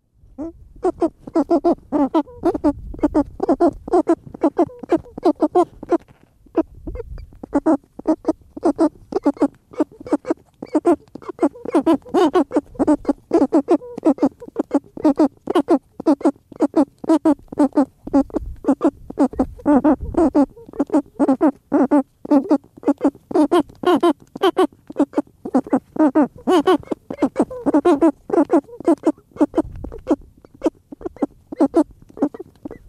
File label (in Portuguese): Vocalizacoes Cagarros Acasalamento; aves marinhas